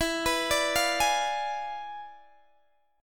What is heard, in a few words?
E 7th Flat 9th E down Guitar Open G